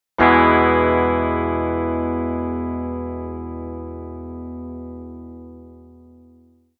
e major

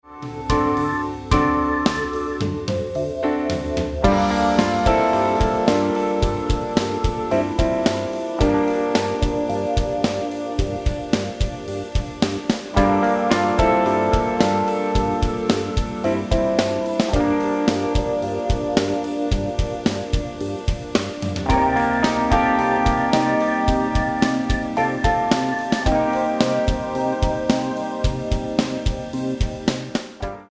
Bass Cool Blues

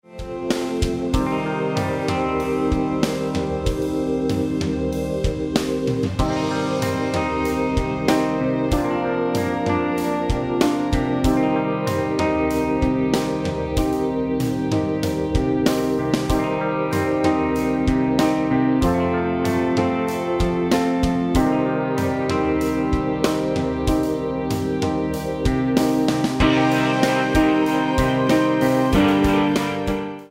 Bass Folk Rock

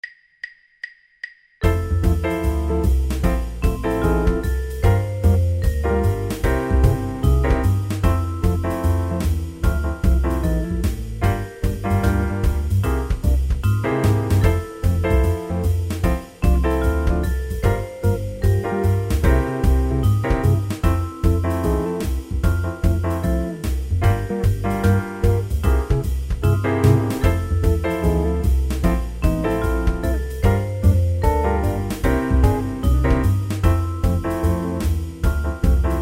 Altered Scales Backing Track; Improvising With Altered Scales

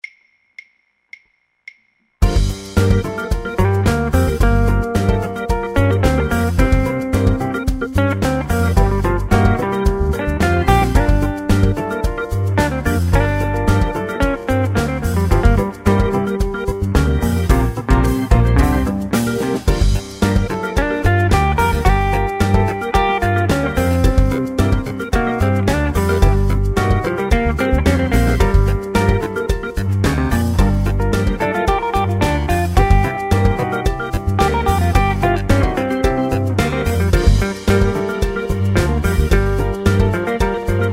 Improvising With Blues Scales Example

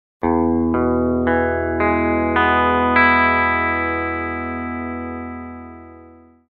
e major arpeggiated, guitar

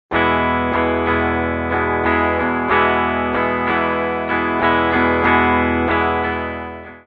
e strumming, guitar

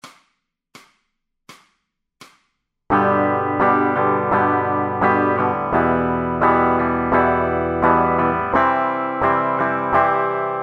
Strumming Patterns For Guitar

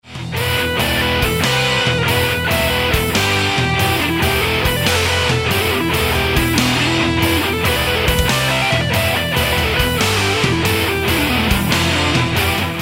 metal guitar scales pentatonic

